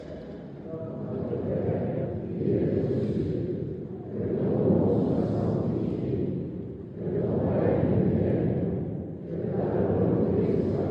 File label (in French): MESSE CHANT NOTRE PERE; CHEF BOUTONNE 79; EGLISE NOTRE DAME FETE PAROISSIALE